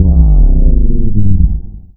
VOX FX; Big Beat Samples & More

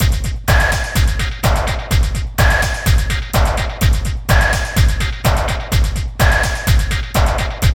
LOOP, Tekno Industrial; East West

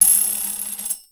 TAMBOURINES, Percussion

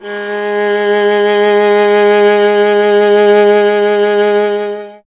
viola G; Miroslav Vitous; Solo Instruments VOL-1

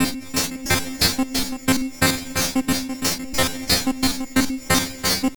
Drum Fx Loop, Electronica; Sonic Foundry (Sony Creative Software) - Electronic Point Blank

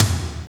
TOM FAT, Drum Kits; Roland L-CD701; R&R Kit 2

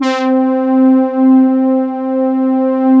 FATTY PADS, JV PADS; Pro-Rec - Synthsonic

